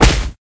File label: Fight Magic Ball Damage; Mighty Party; Android